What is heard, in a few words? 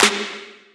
Snare - G#; Drum One Shots; Snares; Cymatics; Light Free Sample Pack; Bonus Samples